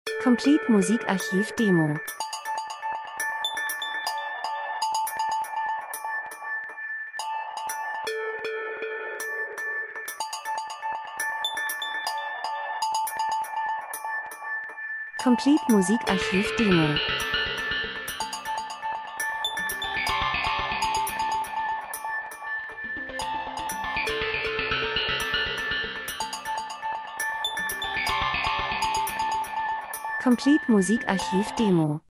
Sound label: drums; Elektro Rhythmus Urban, Zeitraffer, Menschen Zeitgeschehen Maschine Industrie Dokumentation